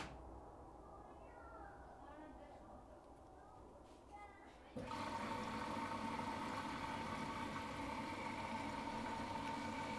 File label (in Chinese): 洗衣机噪音, 家居噪音; 家居噪音音频数据集